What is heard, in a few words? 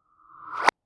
Form Submit